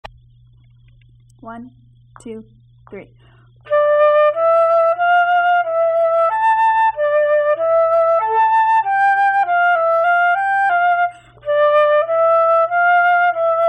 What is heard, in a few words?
menuett flute part